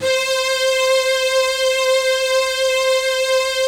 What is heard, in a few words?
PAD